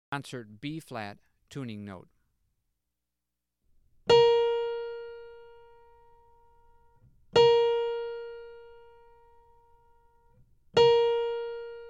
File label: Tuning Notes; James „Jamey“ Aebersold Play-Alongs